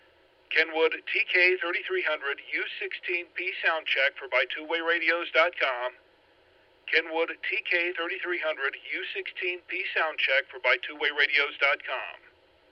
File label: kenwood tk-3300u16p, radio samples